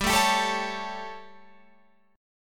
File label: G Suspended 2nd Flat 5th G Guitar Open F; Gsus2b5; strummed; 19,16,19,16,16,16